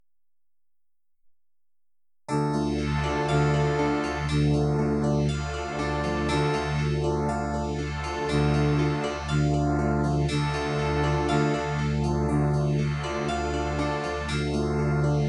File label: Baroque Piece in Dm w. phaser